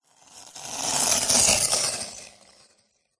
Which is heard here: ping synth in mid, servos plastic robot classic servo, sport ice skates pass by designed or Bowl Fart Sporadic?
sport ice skates pass by designed